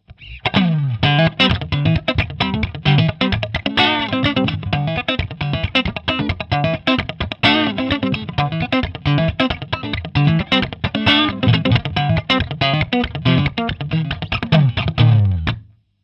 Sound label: Channel 2 Neck Bridge PU